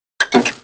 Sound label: BUTTON